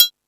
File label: Percussion